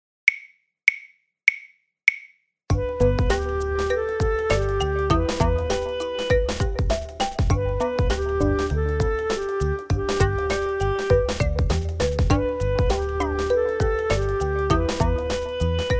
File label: Full Performance